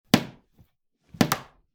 木の床がバキッとなる音

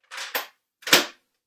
玄関の鍵を開ける音

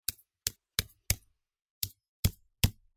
コンクリートの壁を素手で叩く音; concrete

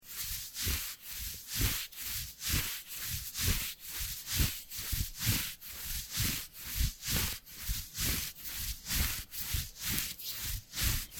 布擦れ音

